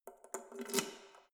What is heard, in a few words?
letterbox metal insert key